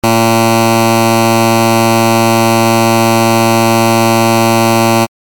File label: beep